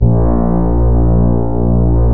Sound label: FM Elmo Pad; 90s Sample CDs; Club-50 - Foundations Roland; FM Syns